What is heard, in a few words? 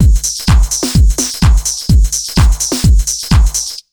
127 BEAT, Fresh Disco House; USB Soundscan vol.20 - Fresh Disco House I